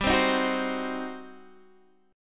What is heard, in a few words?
G Major 7th Suspended 4th Sharp 5th, G, down, Guitar, Open D